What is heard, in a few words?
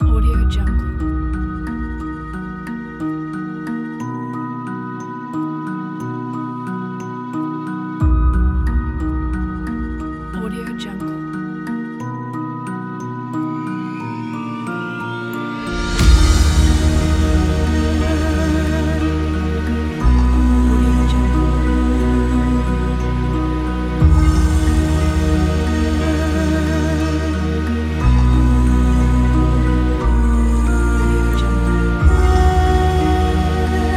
horror trailer; audiogem